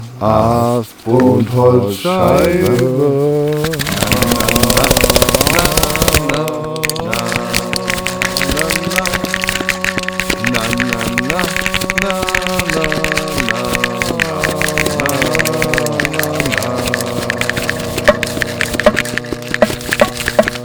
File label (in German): ast und holzscheibe